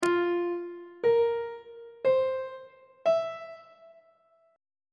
e sharp major 7th suspended 4th chord intervals on treble clef